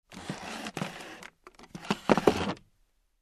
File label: Standing up from leather Armchair